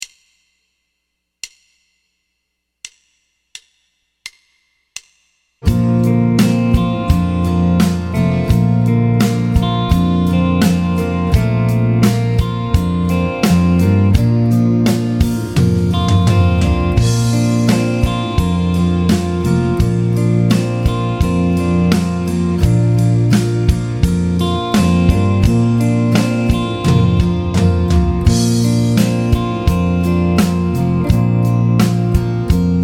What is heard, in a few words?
Triad Vamp MAJ Bb; Triad Vamp Bb Major; Piano Practice Tracks